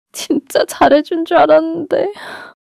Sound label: crying